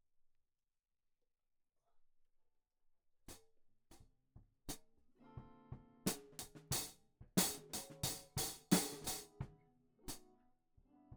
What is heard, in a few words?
Hat